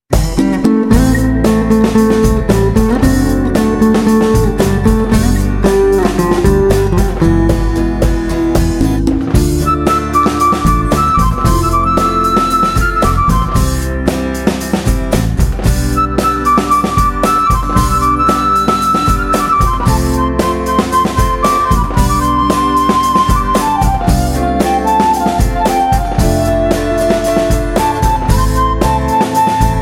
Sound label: Instrumental Tone; Jab Tak Hai Jaan